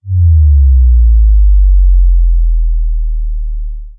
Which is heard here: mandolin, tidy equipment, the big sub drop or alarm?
the big sub drop